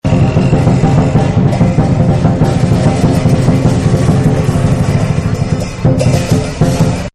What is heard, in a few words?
Chinese New Year; Holidays And Parties